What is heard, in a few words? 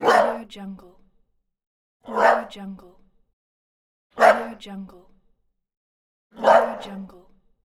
dog